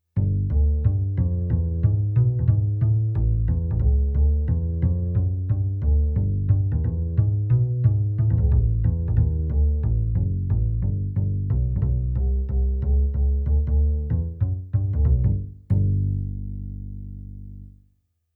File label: acoustic bass; Casio PX-S3100